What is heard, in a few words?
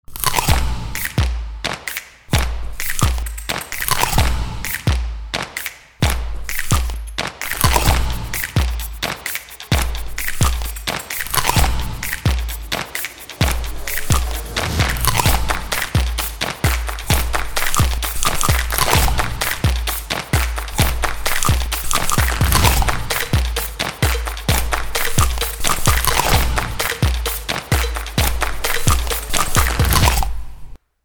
Crunch Song